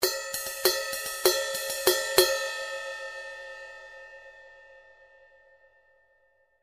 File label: Crash Ride, cymbal; ZBT 18in Crash Ride